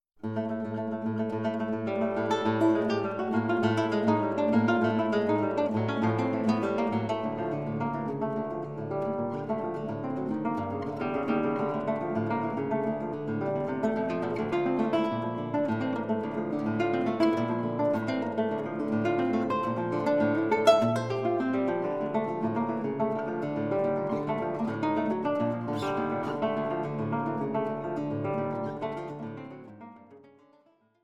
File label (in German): Gitarre